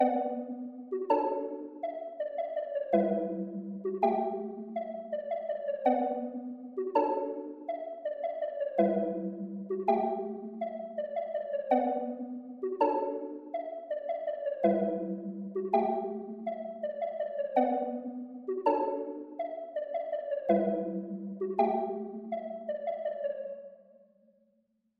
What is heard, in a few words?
164 BPM B Min Keys; Loop Stems; Cymatics; Rose